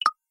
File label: open; hyprland